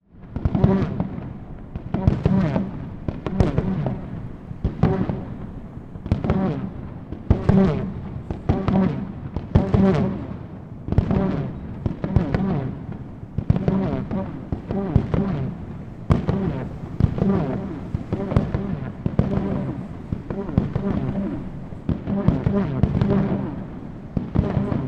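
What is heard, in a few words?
fireworks launch sound and echo; Chino Cultural Complex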